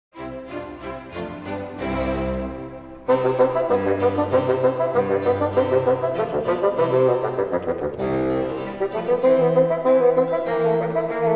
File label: Bassoon; Vivaldi Bassoon Concert